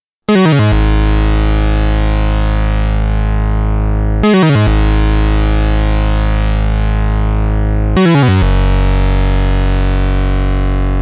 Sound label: pro1 bass